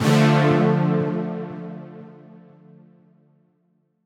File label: Dm Dubbit, Chords; Electronisounds